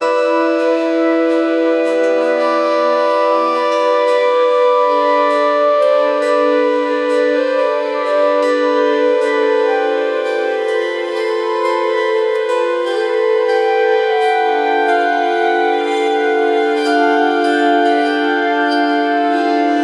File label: beautiful mystical music, forest